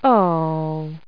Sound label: lose game